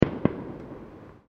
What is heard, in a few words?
Fireworks Multiple Firecrackers